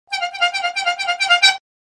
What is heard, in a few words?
horn